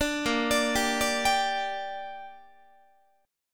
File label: A sharp 6th, guitar chord; A#6; arpeggiated